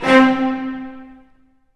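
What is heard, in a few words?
Cello Spiccato A1; Digital Sound Factory Virtuoso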